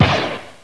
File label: acanon